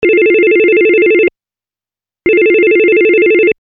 phone

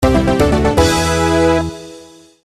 win

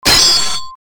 sword

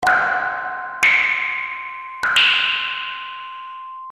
drip

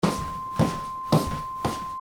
step

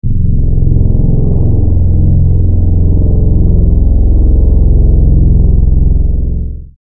airplane

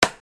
swich

